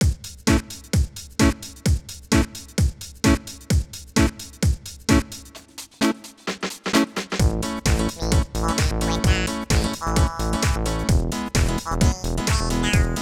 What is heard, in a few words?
Reggae House Remix